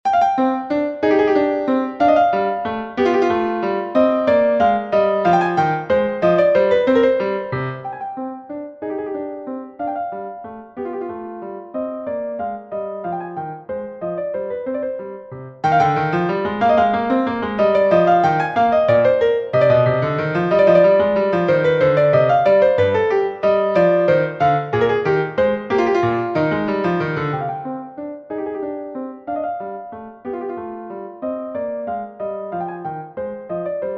menuet

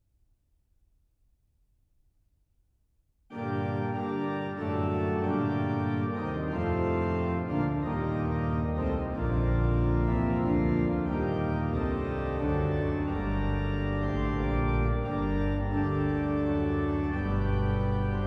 galliarde pour orgue; bernhard schmid; transcfiption; alexandre guilmant

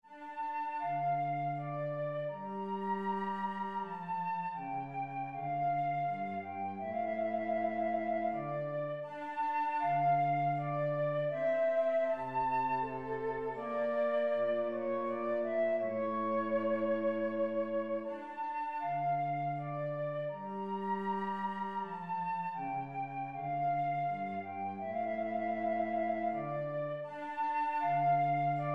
sarabande mineur

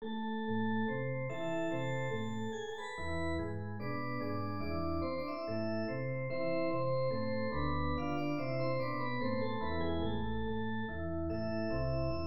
sonate la mineur; cimarosa domenico

